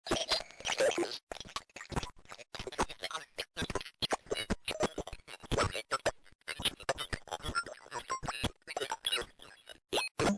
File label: photocell filter pitch